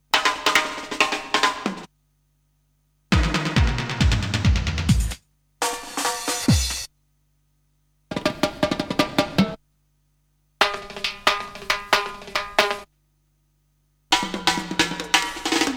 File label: Drum Rolls; Kniteforce; 1000 Samples Volume 1